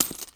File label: coins bag